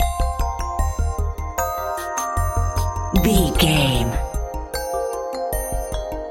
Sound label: detective work loop